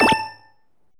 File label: SE LEVEL EXPERT; maimai